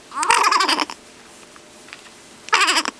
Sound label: baa baa